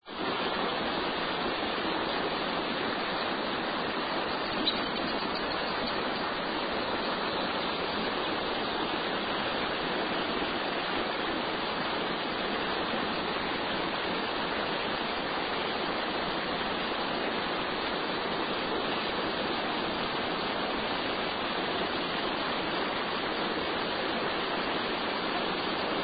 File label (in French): martinet fuligineux, chant